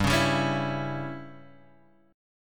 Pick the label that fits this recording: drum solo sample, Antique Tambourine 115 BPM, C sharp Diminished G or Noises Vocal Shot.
C sharp Diminished G